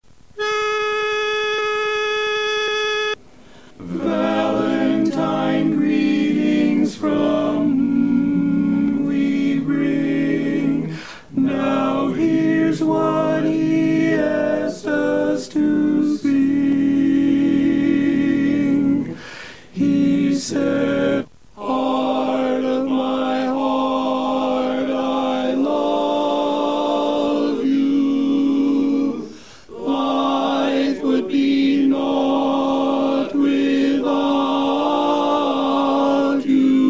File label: TENOR, barbershop quartet; Greetings